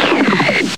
tape death; pitchshifter PSI

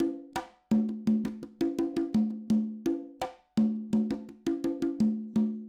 Acoustic Percussion

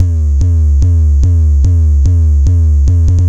Kick Loop, Drums, Dance Beats

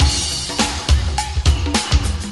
Old School Break, Drums

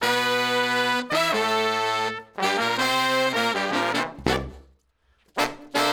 Horns Section

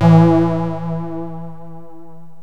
yamaha rm1x synth bass and lead

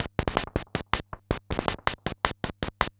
Electronica Drumloop; Drums

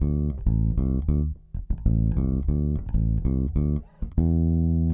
Electric Bass; Basses

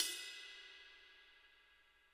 Cymbal One Shot; Drums

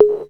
DUB, DRUMS; SoundFactory; SMPMANIA

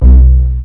BASS; SoundFactory

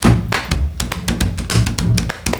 Acoustic Percussion

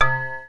C3 MALLET; proteus2

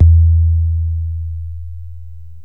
kick bass, yamaha rm1x synth

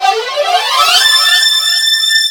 FX STRING; SoundFactory

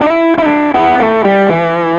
Electric Guitar, Loops; Guitars

